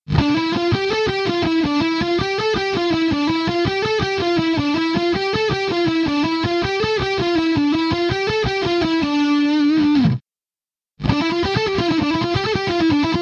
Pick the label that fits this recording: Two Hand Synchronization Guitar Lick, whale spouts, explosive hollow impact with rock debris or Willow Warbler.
Two Hand Synchronization Guitar Lick